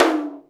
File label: Tom Hi, Alesis DM5